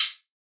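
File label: Riddim closed hihat; One Shots; Hats & Cymbals; Ghosthack; Riddim Essentials 2020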